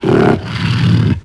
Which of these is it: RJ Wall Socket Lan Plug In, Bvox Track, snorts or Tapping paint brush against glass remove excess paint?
snorts